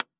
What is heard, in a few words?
iron box mono, Siemens Iron Speaker; Speakers and telephones